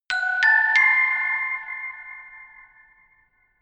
notification glockenspiel creepy triad up diminished